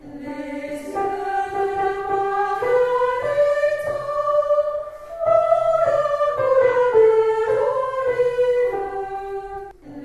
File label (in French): Yeux de la Marianita Soprani